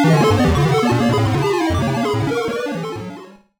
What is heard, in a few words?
Retro Computer